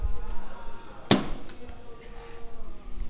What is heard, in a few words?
reverb Fountain Doorway; Pomona; Coop